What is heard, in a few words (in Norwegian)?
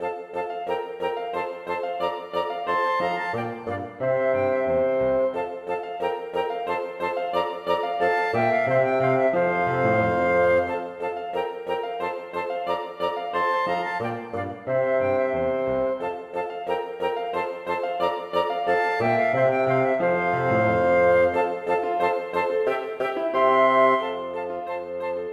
Burlesk for to fløyter, fagott og piano